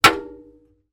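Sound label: household object bang inside washing machine drum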